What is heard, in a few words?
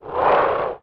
science fiction alien creature growl; sound effects one